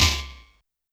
Snare Synth, YAMAHA RM1X

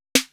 Snare, Drum One Shots

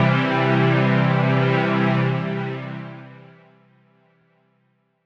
Lush Pad Chord, Old Skool House; Big Fish Audio; OSH Chords

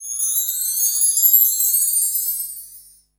wind chime; AKAI S6000 CD-ROM - Ultimate

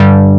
MINIMG BASS

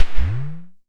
Perc - Bend Up; Tama TS-206

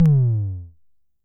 tom, Coron Drum Synce DS-7

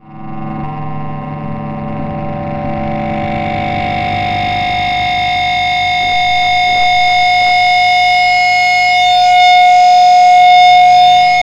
Feedback, Industrial; Nasty

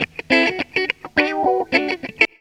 GTR, GTR RIFFS, Funky Elements; Zero-G

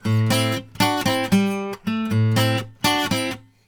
Blues Guitar Samples; ILIO; Fretworks; LICK

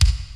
KICK, DRUMS; Crypton - DrumBase5000

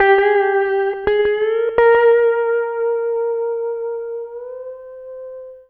Lap Steel, Trip-Hop Loops

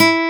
Nylon Guitar E3; Proteus 2000; Digital Sound Factory Proteus 2000

